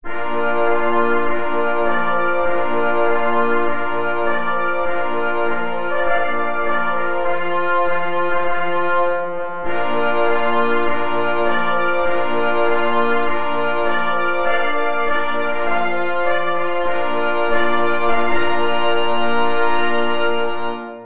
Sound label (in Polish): Hejnal na 2 plesy i 2 parforsy; K.E Kroskowski; Hejnal Lowiectwa Gdanskiego